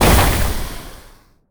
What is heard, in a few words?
Pillar green explo, monster